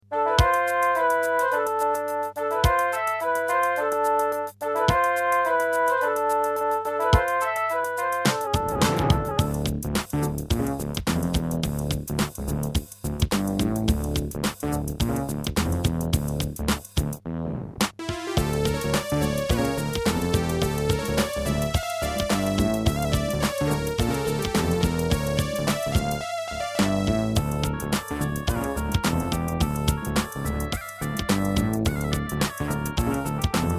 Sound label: Ast Advantage DBII A; Lost Vikings